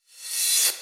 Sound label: Cymbals Reverse Crash; Vengeance Samples